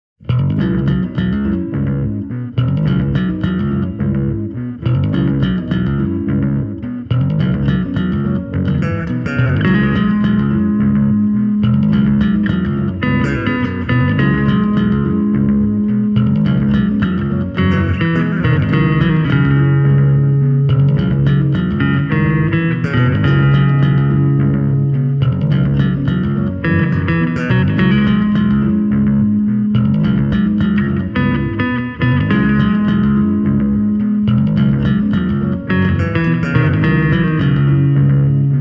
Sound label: markbass evo1 demo track bass tracks only; digitaalinen mallintava bassovahvistin; Markbass Standard 104 HF -kaappi